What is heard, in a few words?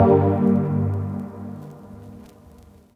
DX200 House Dark Vinyl Synths Cmin G1; vinyl chords